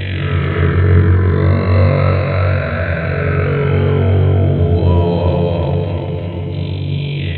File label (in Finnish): Kurkkulaulu loop